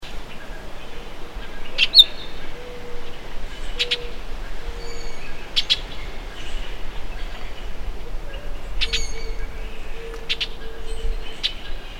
Orchard Oriole, Icterus spurius; blackbirds orioles

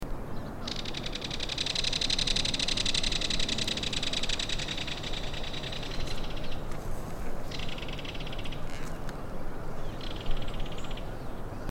Belted Kingfisher, Megaceryle alcyon

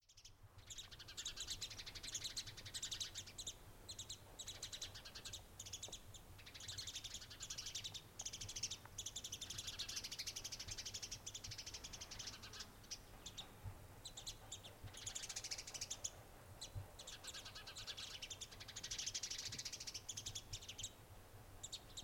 House Sparrow Passer domesticus; sparrows

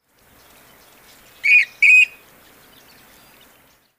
Western Grebe Aechmophorus occidentalis; waterfowl

